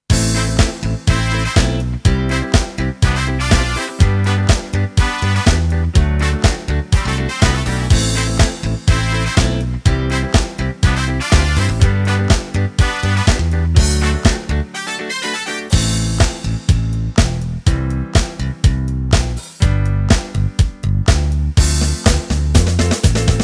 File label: Party Funk